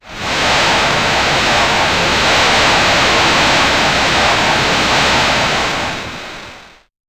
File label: rainstorm in a; artificial intelligence